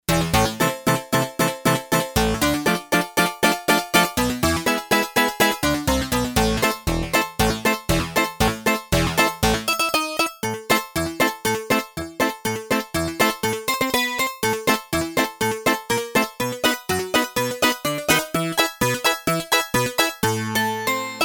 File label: Harpsichord, Yamaha TX802; Performances; Factory Patches